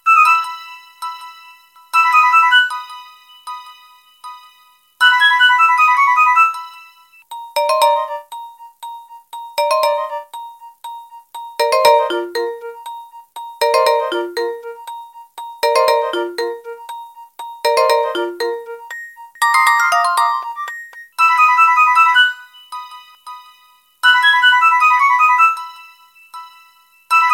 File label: best wakeup sound